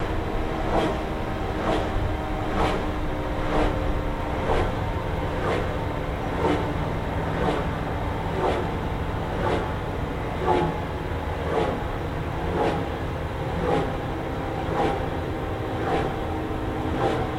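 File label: Heli Blade Slow Run Down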